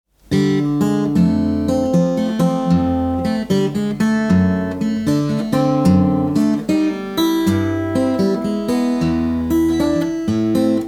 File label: Blues; Blues Book 2